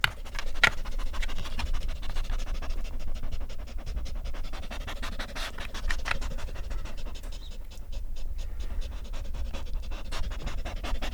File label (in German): HUND